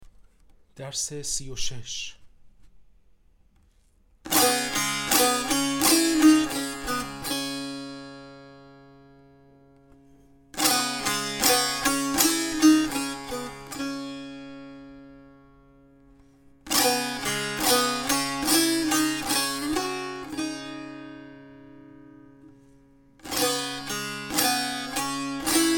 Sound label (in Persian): مشق تنبور